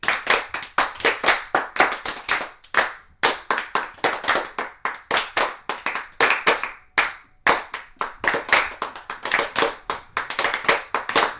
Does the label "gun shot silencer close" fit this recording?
No